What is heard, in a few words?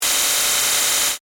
Electrical Current, Scifi, Electrical, Current, Jolt, Science, Fiction